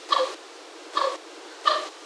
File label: Grus japonensis voron